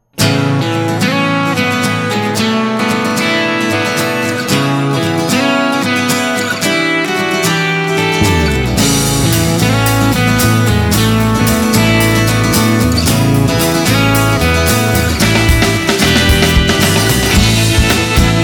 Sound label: POP Rock